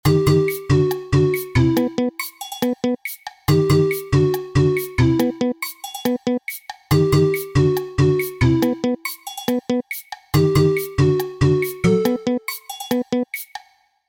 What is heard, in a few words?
Mystery, loops